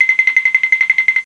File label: Rattle; SoftKey